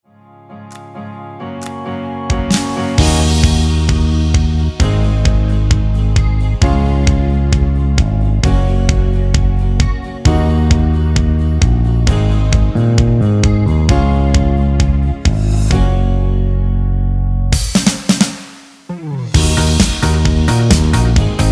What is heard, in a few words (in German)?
ohne CHOR